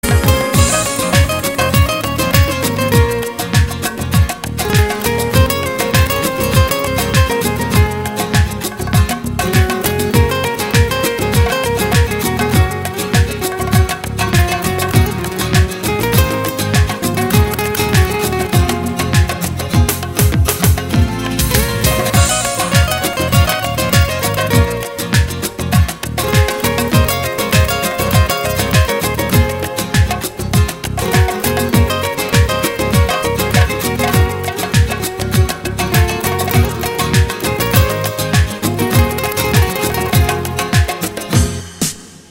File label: Melody, RingTune